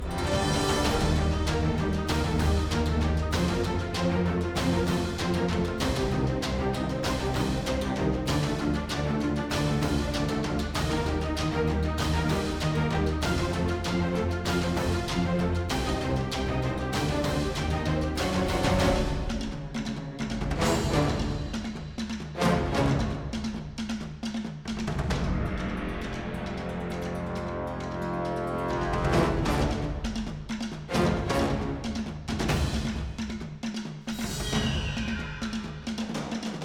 Action Urgent; rwby grimm eclipse

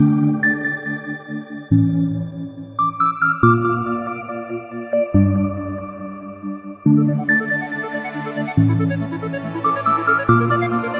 Floor 4 loop

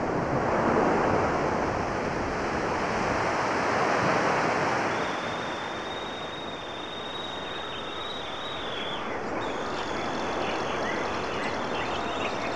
ENV St Loop; fishing resort family fishing 2011; wii

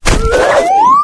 Frog Jump; candy crush saga gamerip

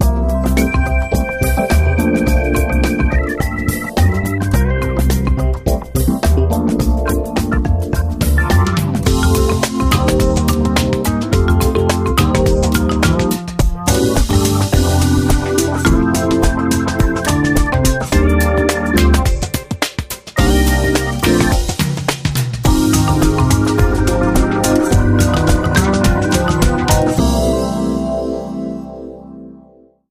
Smooth Steel, Variations (Professional)

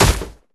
Flag Fall

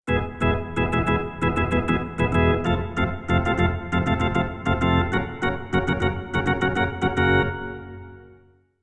Organ Score; Backyard Hockey

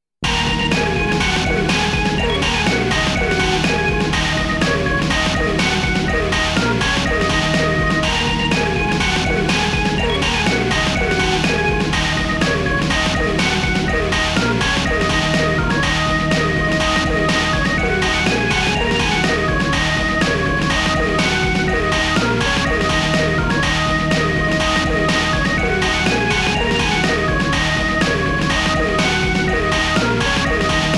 Chicken Rumba; Fuu Un Mokushiroku; Savage Reign; Chun Ending

